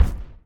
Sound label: footstep